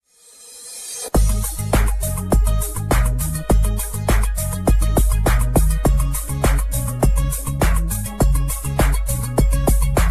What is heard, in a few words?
instrumental; moroco